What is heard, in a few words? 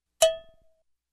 Cowbell Hit